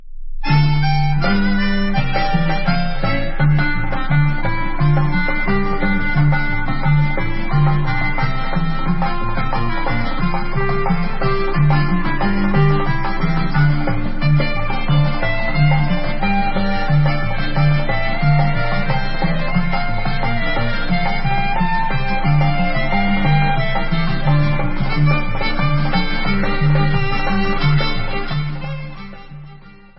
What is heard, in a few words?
hora, instrumental